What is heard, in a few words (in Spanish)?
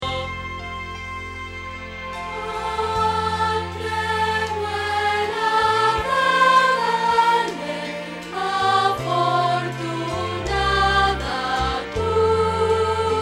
Contralto